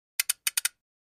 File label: Flash Gear Slow Low Pi